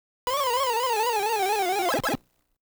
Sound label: Fail